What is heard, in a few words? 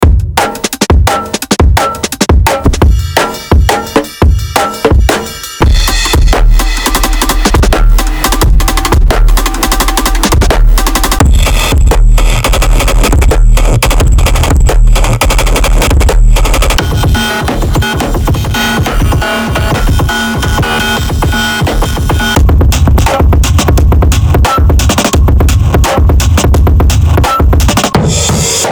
Raw Acoustic D&B IDM Series Kit